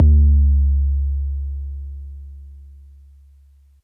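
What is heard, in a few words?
bass drum 808 MPC60 Noise C#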